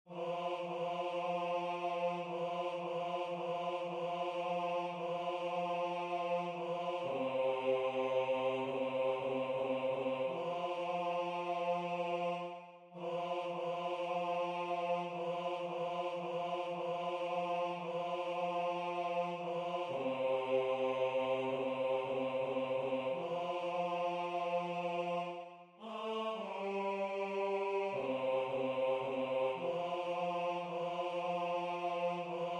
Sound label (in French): Basse; Der Lindenbaum; F. Schubert